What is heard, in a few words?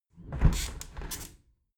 window close regular sequence